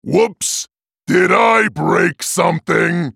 attack; Trundle

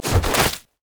emote death; quinn valor

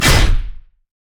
basic attack; nautilus

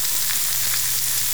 caustic spittle loop; LoL; kogmaw

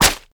basic attack; LoL; caitlyn

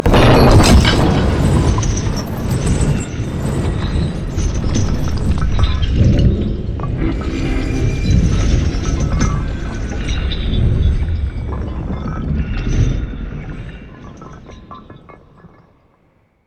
chains fall left; LoL; emitters map12

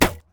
minotaur basicatk; LoL; alistar

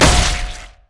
basicattack; Yorick

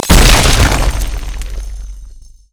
pain; LoL; trundle

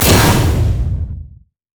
impalingcharge; LoL; hecarim

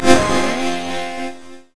power chord; sona; paxsona

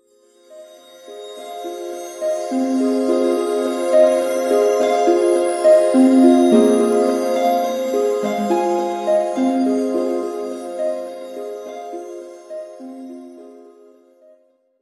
hymn of valor melody; sona

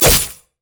crit attack; Zed

